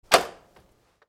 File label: handle